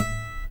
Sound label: ACSSFTFNGR, Guitar